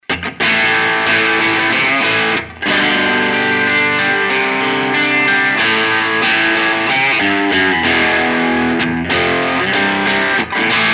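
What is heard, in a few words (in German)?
Two Notes Le Crunch kanal b strat steg pickup wall of sound iii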